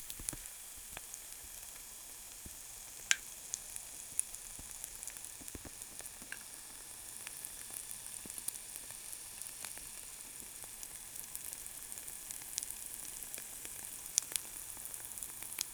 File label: gentle miso boiling faint